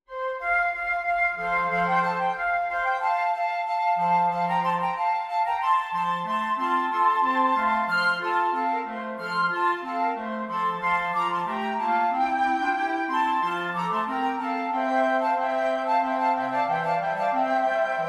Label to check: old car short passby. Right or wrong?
Wrong